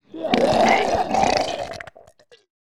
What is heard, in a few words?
Zombie Disgusting Sounds, Monster Sounds; The Decline Of Warriors; Monster Sounds Volume II